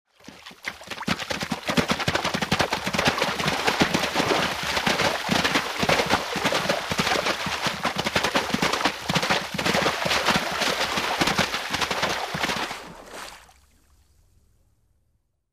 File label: horse gallops through the water